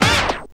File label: TURNTABLE; Zero-G; Funky Elements